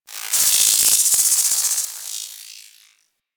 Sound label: Tech Movement; Ambience; Warframe; Corpus Railjack